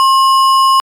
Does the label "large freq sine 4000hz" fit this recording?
Yes